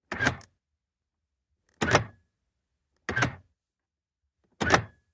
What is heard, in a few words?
Electric Door Lock; VEHICLES - AUTO ACCESSORIES: Electric door lock.